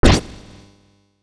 Sword Hit

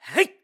Attack; BOTW; Breath of the Wild; Link

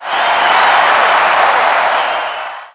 Crowd Sudden Death

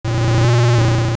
Float; Vaati Transfigured (2nd battle) floating

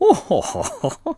Laugh; Match Master laughing (first time meeting Link)

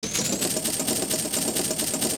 Hanging Block; Shadow Temple; zelda; OOT

